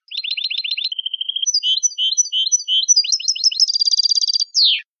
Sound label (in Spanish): acanariado